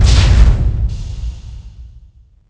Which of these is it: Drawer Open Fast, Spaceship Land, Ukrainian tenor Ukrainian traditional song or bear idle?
Spaceship Land